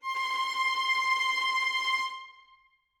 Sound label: violin trem C6, Strings; M8; BBC